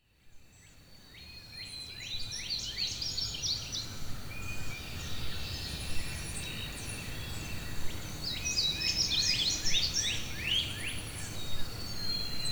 Ambience Forest Crows Birds Chirping; Wav Junction Sound Effects

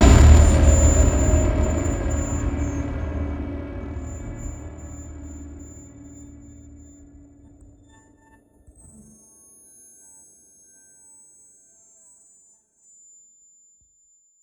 Distorted Sqweak, Cinematic Impacts; 99Sounds; Rumore

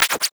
scratch, Interface Sounds; Kenney's Sound Pack

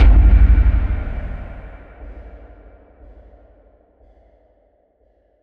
Cimpact sound, Industrial Samples and Impacts; Bluezone; Cinematic Session Industrial Samples and Impacts

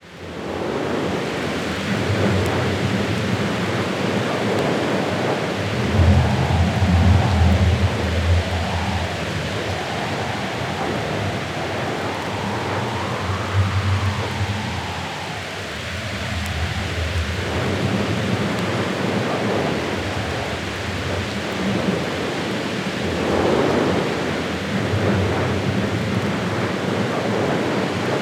ambience wind, Alien Planet Ambiences; BlueZone

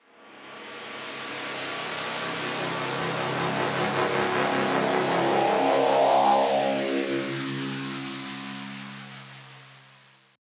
Movement; 99Sounds; InterSpace

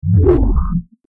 time stop; FXHome

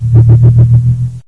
radar; Kenney's Sound Pack; Retro Sounds 1

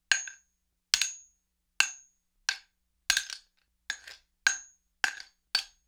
Mace Seax Blade, Weapon on Weapon; StillNorthMedia

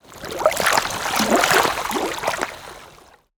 isolated lake wave; BlueZone; Lake Wave Sound Effects

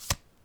card Slide, Casino; Kenney's Sound Pack

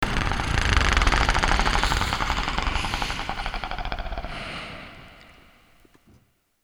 Aliens Monsters; FXHome

